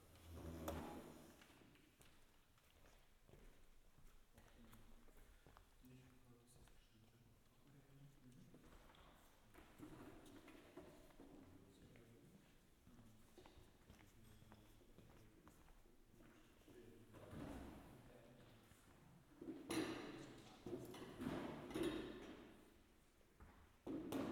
Bestuhlung; Lexikon-Roman

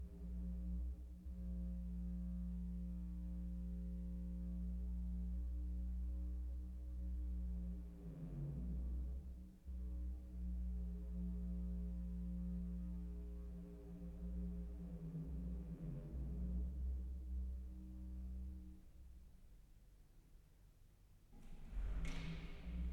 Orgel; Lexikon-Roman

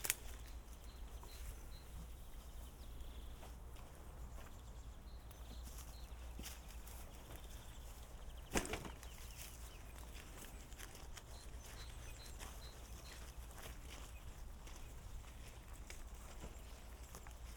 Radschieben; Lexikon-Roman

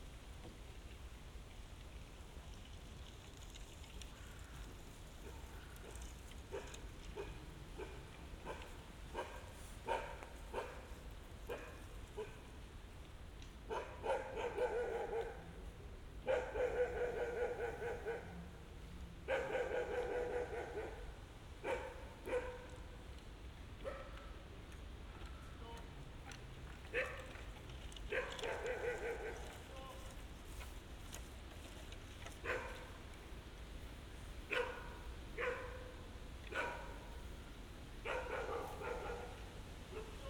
Hundezone; Lexikon-Roman

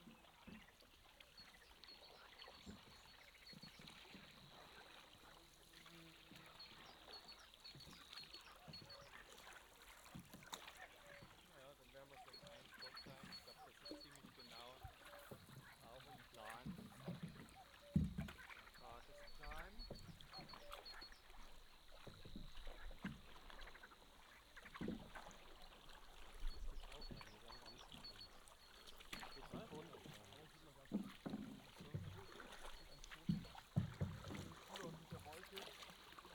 Paddelboote; Lexikon-Roman

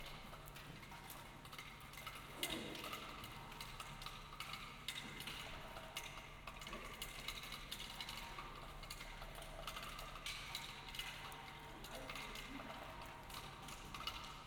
Reggenrinne; Lexikon-Roman

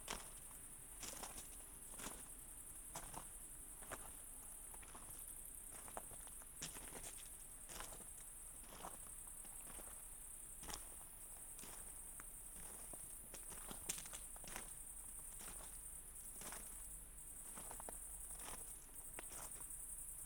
Gehen Kies; Lexikon-Roman

